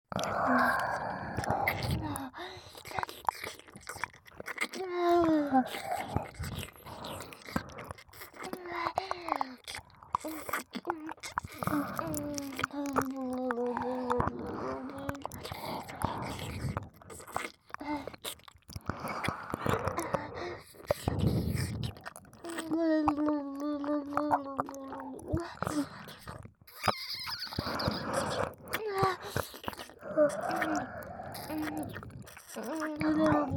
乳首舐め＆耳舐め, ロリ声, ASMR; ロリ声ぷにマン×オホ声ビッチの濃厚両耳責め～エンドレスな精液搾取にご案内～

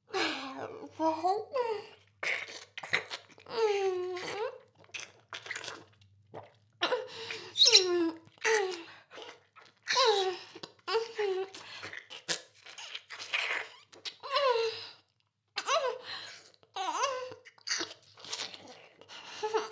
フェラ; 全妹計画２; メスガキ妹; 全妹計画<オール・シスターズ> 2妹め

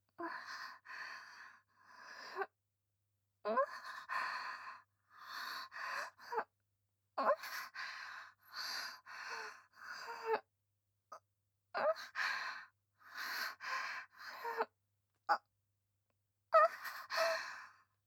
喘ぎ と 吐息（ひかえめ）; 【甘々媚びアクメ】性欲旺盛な陸上部の先輩後輩JK、ベロチュー青春溺愛えっちでコーチの汚ち◯ぽ生ハメ特訓♪

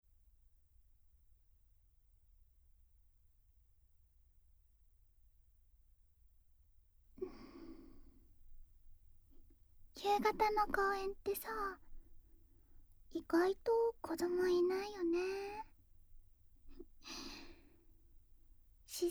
公園のベンチで耳舐め＆パイズリフェラ

